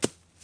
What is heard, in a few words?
golf ball hit rough deep; ProStroke Golf World Tour 2007